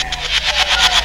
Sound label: Marbles Slide; Jungle; Blu Mar Ten - Jungle Jungle - 1989 to 1999 Samplepack